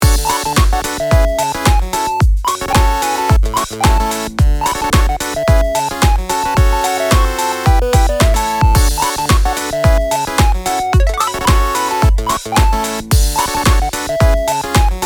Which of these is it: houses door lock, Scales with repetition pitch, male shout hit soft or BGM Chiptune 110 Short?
BGM Chiptune 110 Short